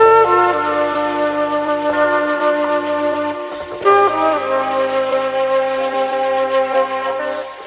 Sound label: flute, Jazzmatazz loops